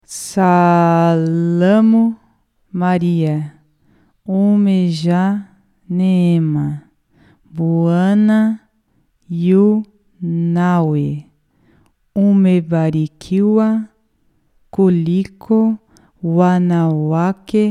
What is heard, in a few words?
ave maria suhaili lento